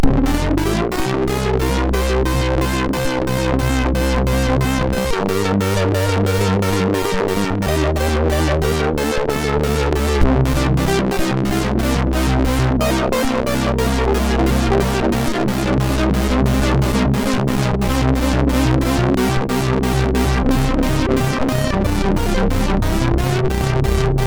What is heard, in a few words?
sid MOS6581R2